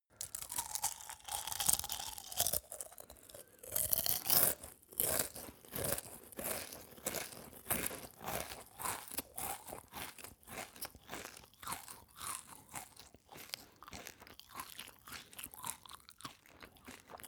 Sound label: male eat cereals full